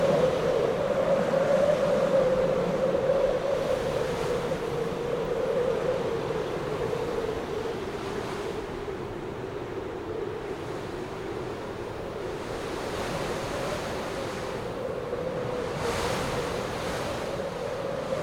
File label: outdoor winter snowstorm; teardown; ps5 windows xbox series xs